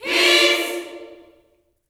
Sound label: PEACE CHD, Vocal Gospel; Spectrasonics; Vocal Planet Vol.1 Gospel; akai